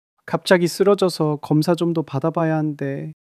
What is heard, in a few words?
crying